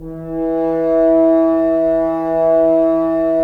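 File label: Solo French Horn, Brass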